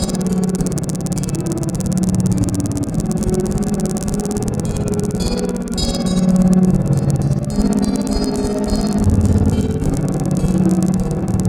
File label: guitar loop; modelonia guitar